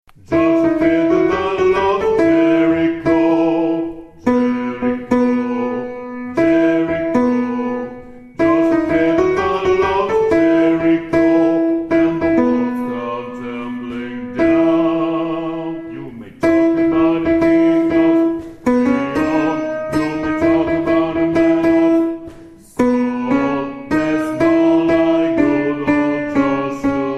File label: Basses; JERICHO